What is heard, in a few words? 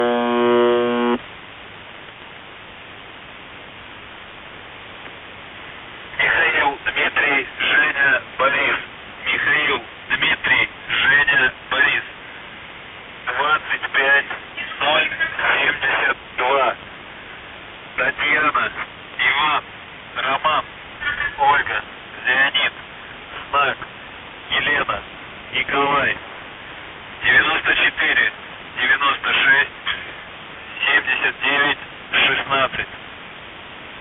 buzzer